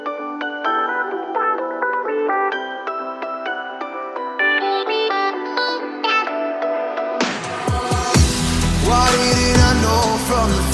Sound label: new english song ringtone